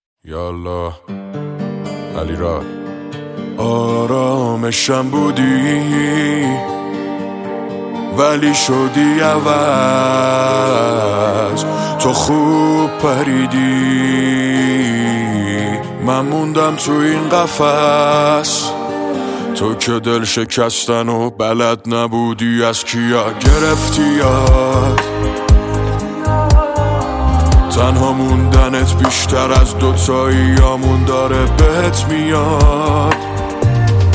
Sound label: music sad; آهنگ غمگین گریه آور مخصوص اینستا